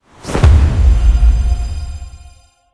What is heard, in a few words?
angelic levelup; Smite; Thanatos